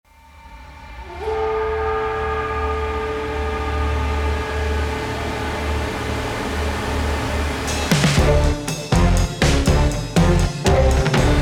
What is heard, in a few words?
120 bpm 3-4